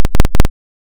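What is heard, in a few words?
ring modulation sqr tri, silence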